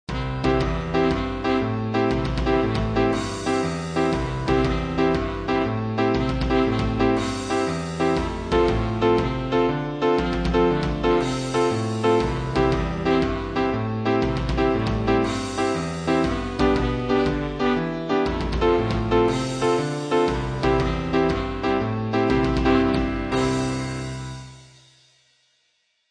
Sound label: melody